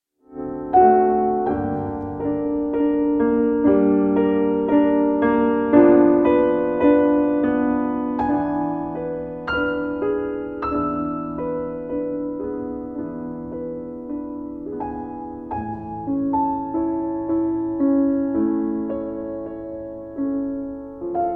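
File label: melodie for piano solo; gluck arr sgambati; orfeo e t eurydice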